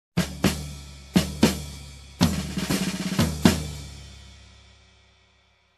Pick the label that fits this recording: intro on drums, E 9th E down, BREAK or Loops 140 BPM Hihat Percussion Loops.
intro on drums